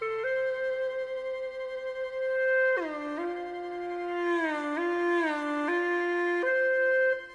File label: Hulusi